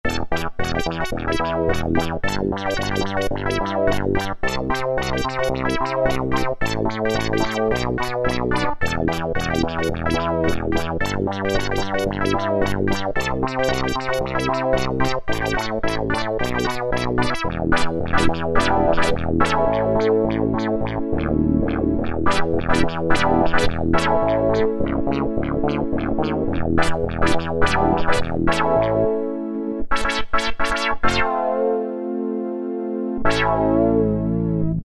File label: PROPHET DISCO; Kurzweil K2000 Best of Analog